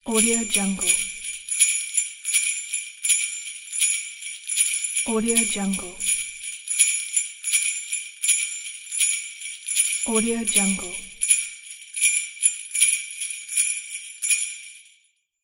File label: the jingle bells